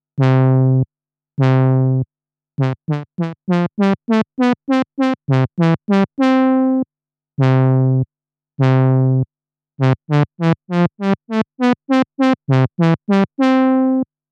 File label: Amp